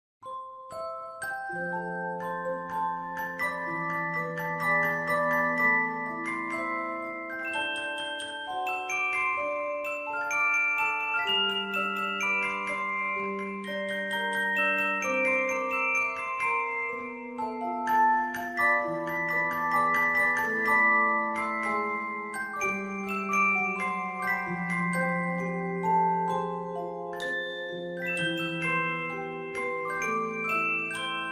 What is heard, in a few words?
music box ver.